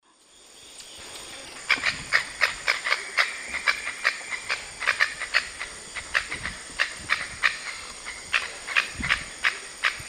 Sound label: Pteroglossus azara, calls; S. America; Eastern Lowlands